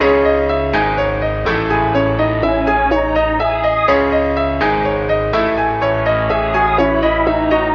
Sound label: piano, sad